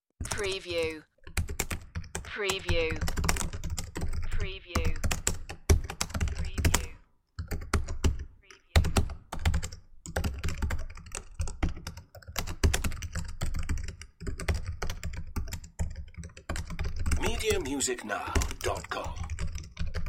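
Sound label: Typing Fast; electronics; computers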